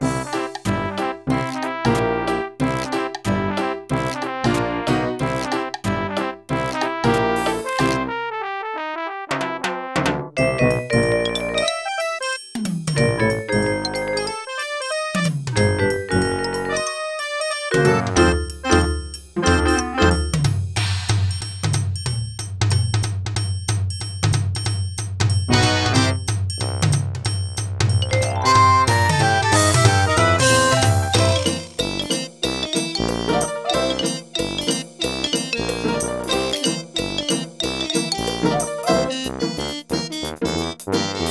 party swing dance

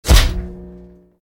laser beam off; Toontown Online

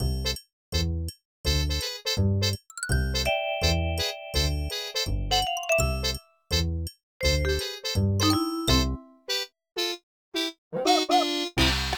party generic theme jazzy

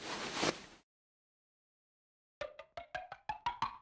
crunch miss; toontown-ceiling

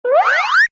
toon bldg grow; Toontown Online

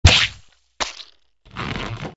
drop sandbag

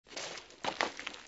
getting up guilt trip; Toontown Online

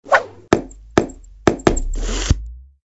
hardball

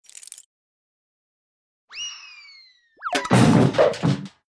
kart appear

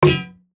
Golf Hit Barrier; Toontown Online

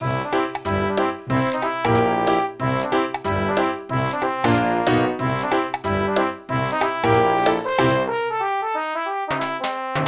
party swing dance

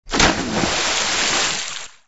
cannon splash; Toontown Online

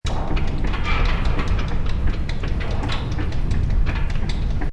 gears turning

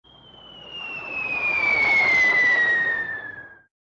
incoming whistle

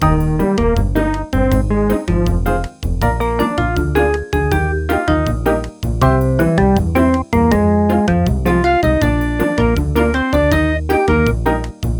elevator

